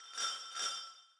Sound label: tone; Christmas; seasonal